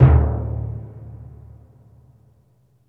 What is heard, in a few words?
TIMPANI D2; ORCH.PERC.; Instruments & Percussions; InVision Interactive; Lightware VOL-1